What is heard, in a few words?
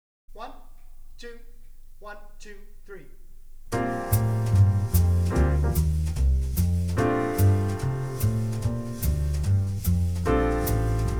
consonant or dissonant